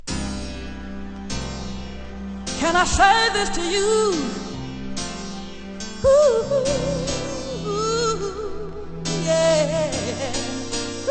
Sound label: viola